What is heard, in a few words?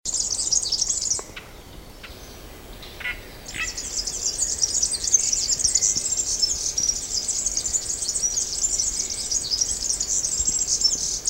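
canary; kanar vierok; ornitologiya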